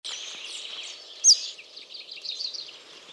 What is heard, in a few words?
Fringilla coelebs; SETE